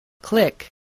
click

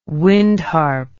wind harp

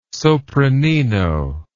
sopranino

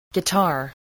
guitar

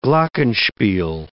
glockenspiel

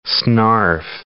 snarf

